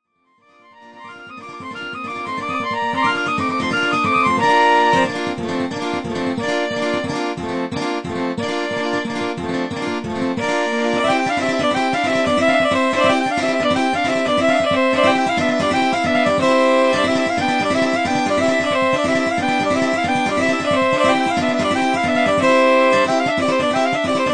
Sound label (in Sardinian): ghitarra e violinu robotizaus; sardegna